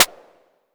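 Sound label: vermona drm1 mk3 snare drum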